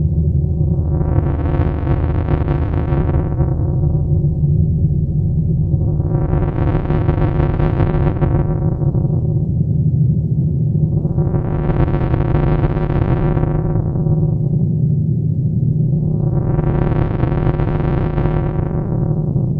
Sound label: dirty deep atmospheric, looping ambience